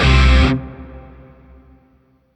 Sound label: Shawzin Hirajoshi Chord I; Music; Warframe; Grineer; Chords